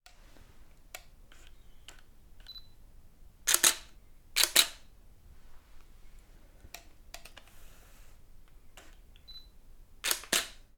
Camera

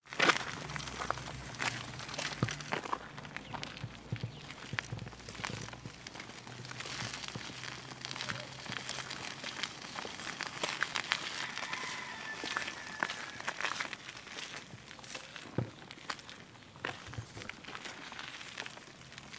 Dirty Road Pram Walk

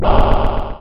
Indu Scratch

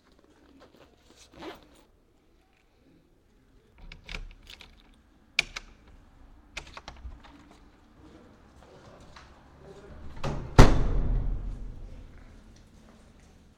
Door open close

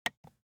Switch Light

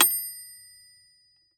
Metronome Bell 96

